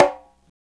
PRC PDC CO, Latin; Roland - Rhythm Section; Latin 4

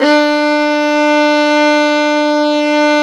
violin D2, Balkanish Traditions

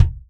Real Drum Kit, ROCK KIT

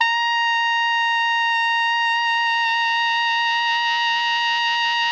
TENOR A#5, Saxophones; Greytsounds; Philip Wolfe David Crozier's Saxophones

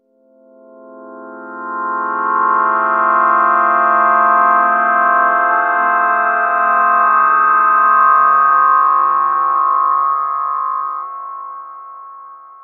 Mystery CFAb, Spacey; Zero-G Ambiosis

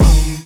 BD, Dance Mega Drums, BD HOU; Best Service

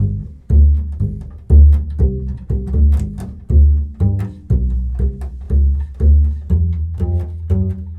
Bull Fiddle, Appalachian

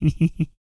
LAUGH, Jazz; Spectrasonics Vocal Planet; Jazz FX

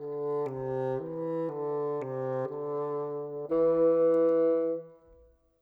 Bassoons, Orchestral; Sonic Foundry (Sony Creative Software); Orchestral VOL-2; Modern 05

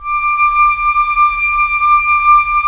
FLT FL, Flute; Roland - LA Composer vol. 4

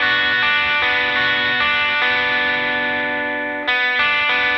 Guitar, Indie Rock; Sonic Foundry (Sony Creative Software); Indie Rock Anthems

